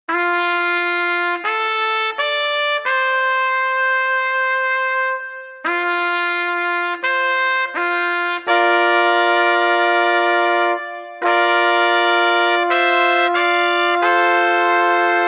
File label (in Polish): hejnal; wer podstawowa